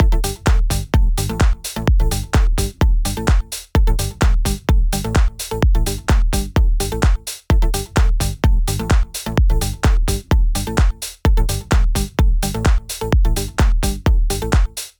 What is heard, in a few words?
Music Loop